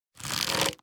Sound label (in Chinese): 弩快速装填二阶声